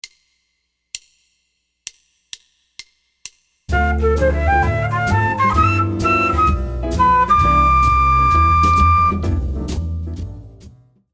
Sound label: 9th landing